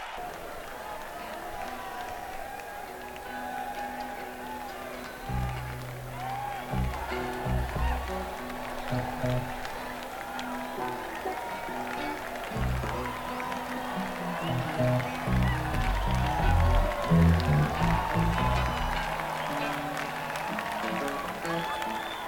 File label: Crowd; neumann km84i